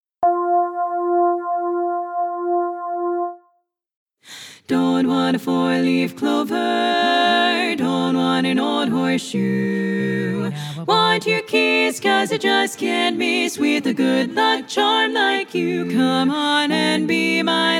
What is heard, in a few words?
Lead